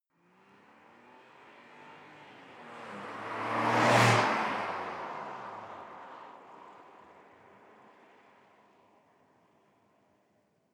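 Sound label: sports car exterior passing by fast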